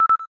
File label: cam timer last